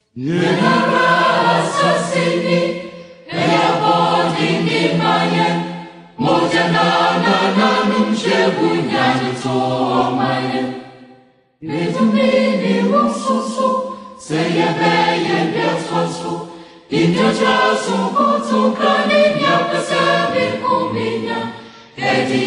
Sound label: Vocal Ghana national anthem; Yen Ara Asaase Ni